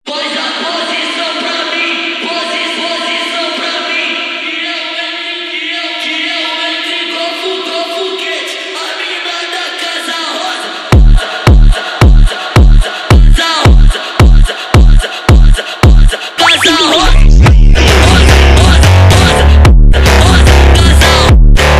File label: phonk